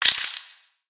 Spider Attack Ranged; creatures mechanical; Lego

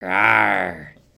Dragon Rawr; emotes

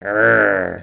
Dragon Emote

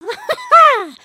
dance; emotes

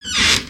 Gnarled Torch Creak

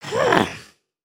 indignant, emotes

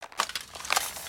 horse snort; creatures organic

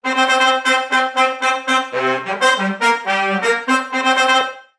Funky Horn Item

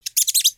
Pet Rabbit Speak; creatures pets

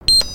Launch Pad Beeps; gnarled forest

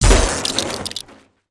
laser cutter smash; destructibles

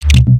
illegal placement; aronwk collection; ndaudio

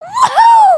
celebrate; emotes

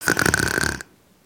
snore in

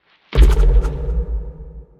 Siege Field Arrows Rain Start

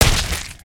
Banana Gun impact

